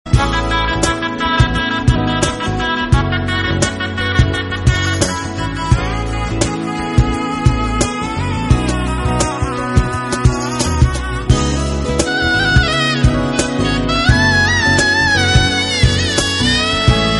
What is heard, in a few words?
Shanai